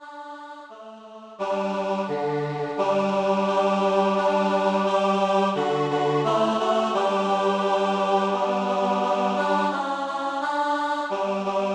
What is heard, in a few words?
Psallite unigenito Bass